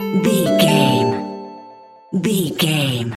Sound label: jingle chime positive